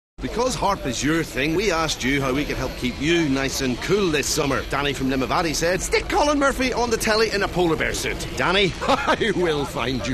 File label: Harp